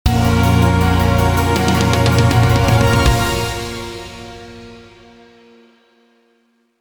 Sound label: Failed